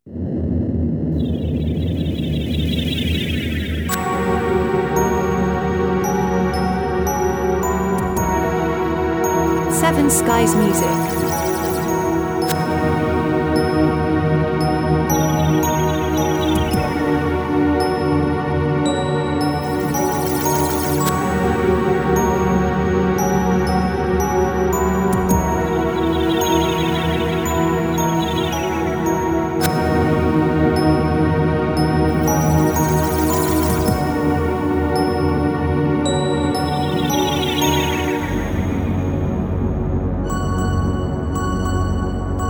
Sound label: Spaceship